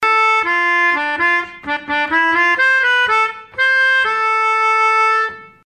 reklam muzigi melodika; aygaz

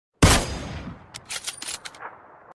kar 98 bildirim sesi

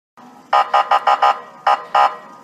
polis sireni

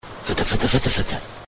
gecis muzigi; isler gucler

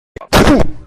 bildirim sesi; sonmez reis

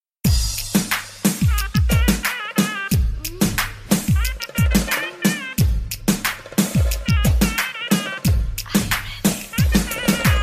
hareketli beat

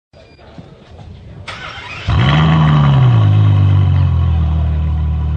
araba

